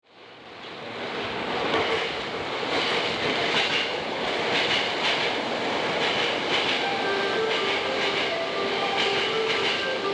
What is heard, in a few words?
yurakucho melody, jreast yamanote; 3番線（山手外）発車メロディ1